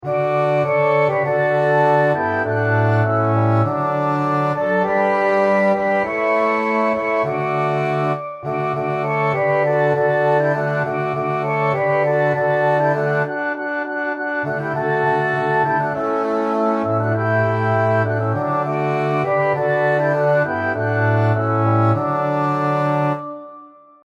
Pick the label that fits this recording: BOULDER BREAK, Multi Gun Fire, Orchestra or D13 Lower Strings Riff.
Orchestra